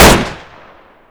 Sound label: p38 shoot; weapons